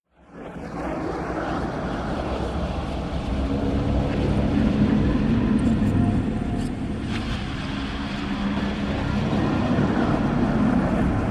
Unfolding Complex Modu, liquid

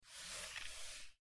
Short Squeaky Mouse

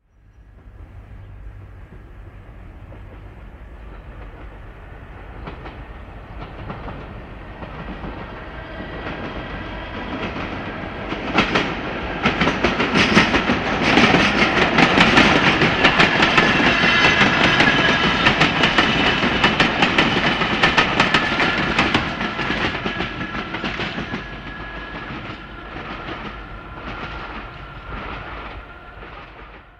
Electric Train Medium By

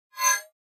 Mouse Over HF Elect, mouse over

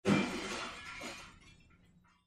Crash Glass Impact; windows & mirrors

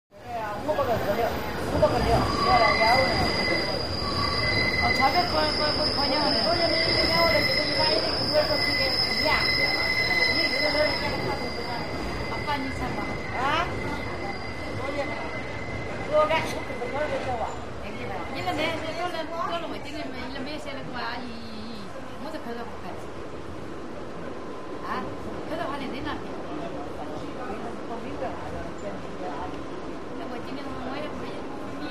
Interior Modern Subw, subway

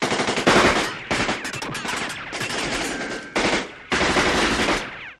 Gun Fight Vari Shots, modern

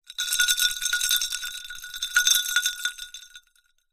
ice glass, glass clinks

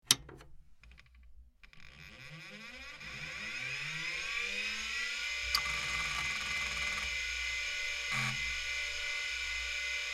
Pc Startup Aborted, hard drive whir

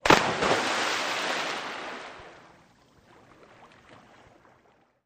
Splashes Large Splash

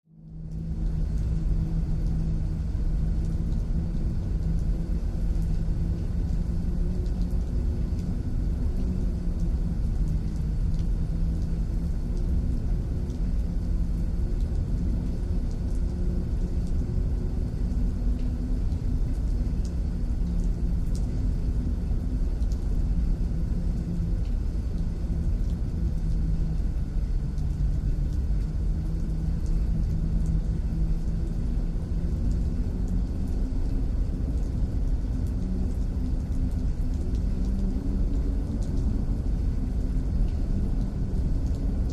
Mysterious Dripping, drips

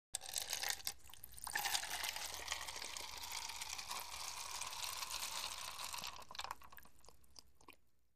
Water Pour Over Ice, pour

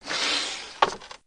Tripod Leg Sml Smoot

